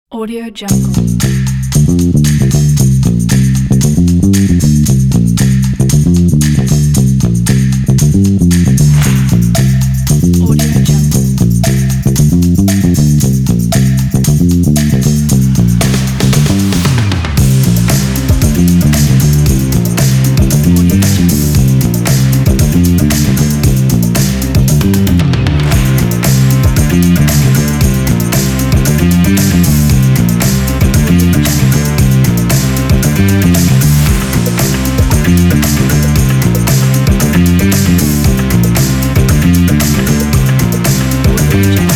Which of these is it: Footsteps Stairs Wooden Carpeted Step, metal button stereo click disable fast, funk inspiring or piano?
funk inspiring